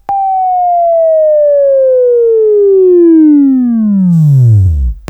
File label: Buzz; Labview Program; Sweeping Training program